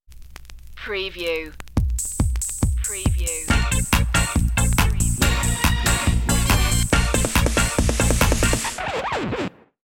short vintage dance cue stinger

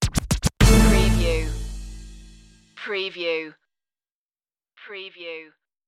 hip hop intro stinger

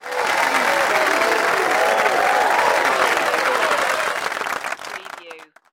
Crowd Cheering and Yelling

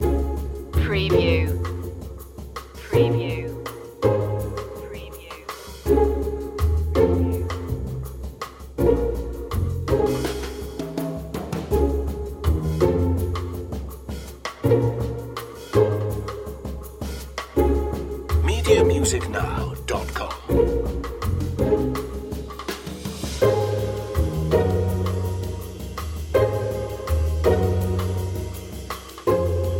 entropy humor loop

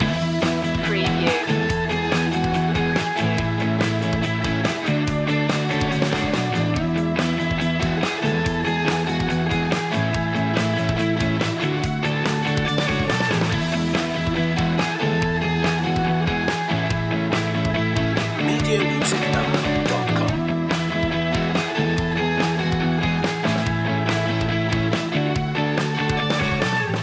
celebrate loop

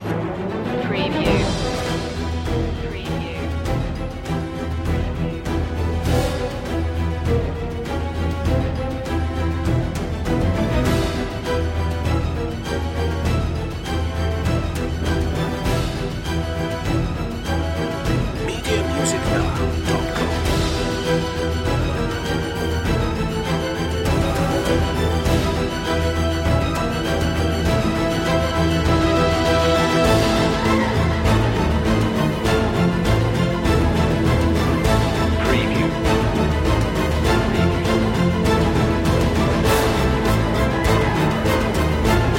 no choir

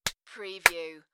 Clap Clap; beanstalk audio

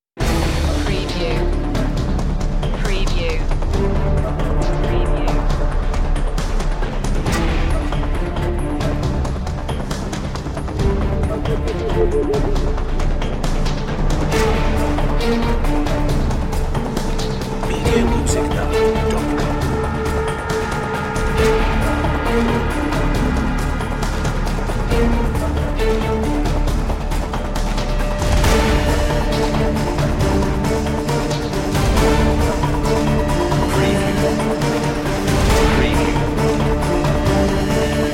underworld series dramatic cue

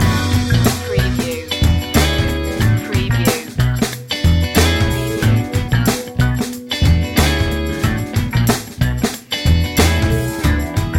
funky loop

